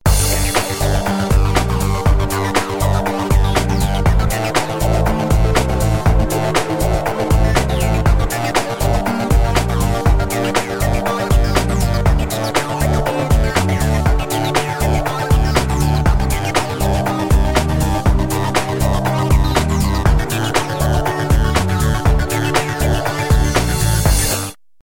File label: Groovy Electro; MIUI14 and HyperOS 1.0